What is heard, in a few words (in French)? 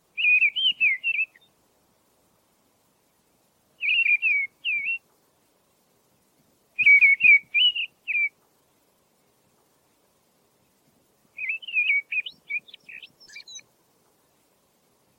grive draine